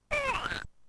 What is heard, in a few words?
Splat!; lemmings; x68000